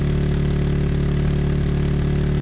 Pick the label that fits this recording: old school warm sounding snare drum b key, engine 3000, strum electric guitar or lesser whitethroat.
engine 3000